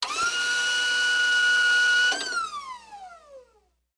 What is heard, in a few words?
CLEAN, industrial